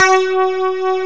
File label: GTR, Clean E.Gtr Death Strat